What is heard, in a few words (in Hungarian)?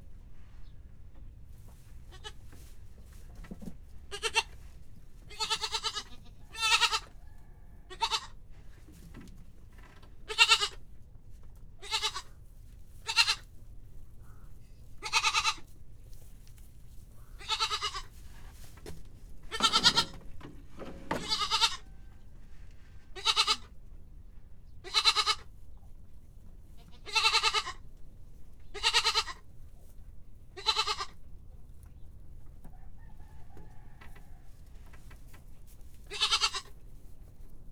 fiatal állat, házi kecske; Hortobágyi Nemzeti Park 2013